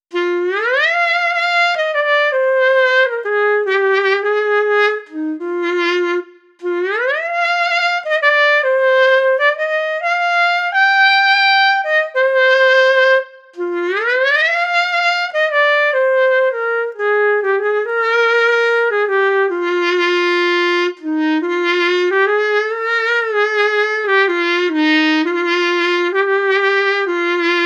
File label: clarinet